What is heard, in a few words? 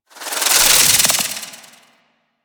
Slinky Movement Rattle; Warframe; Man In The Wall